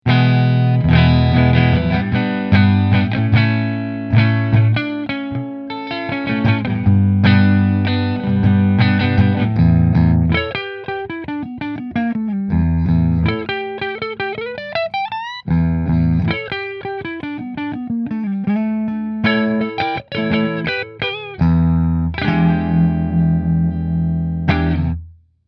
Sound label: Position 1 Fender; Ranger